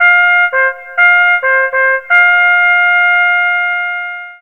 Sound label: horn, horn signals; wesnoth